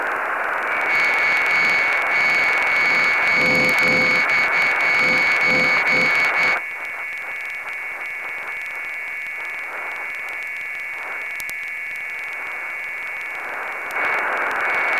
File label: CQ 6k, High Speed CW Meteor Scatter